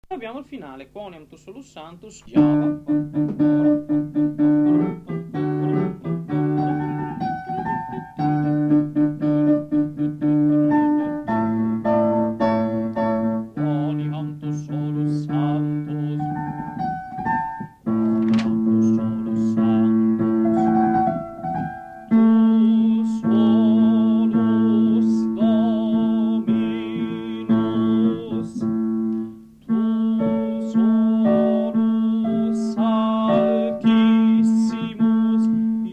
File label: Bassi; Quoniam Tu solus Sanctus; Vivaldi; Gloria